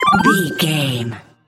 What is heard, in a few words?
collect item sparkle pop